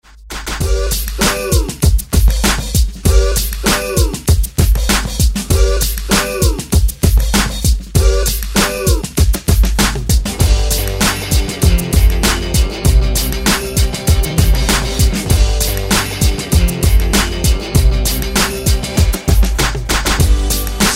70s Ext Redrum

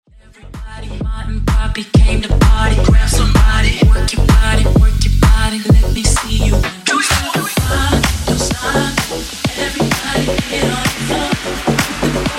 Funk Wordplay Segue Trans 128 83Bpm

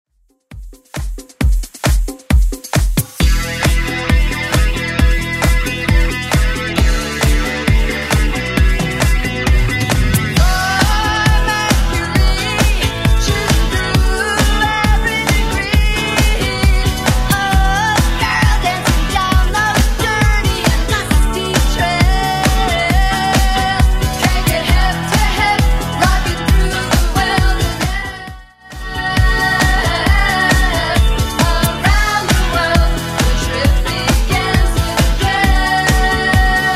80s Club Edit 134bpm

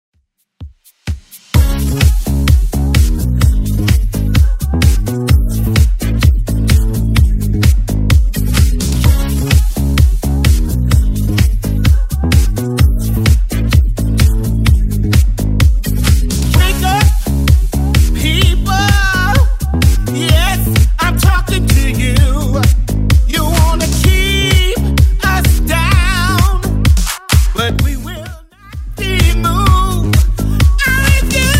80s Redrum 128bpm

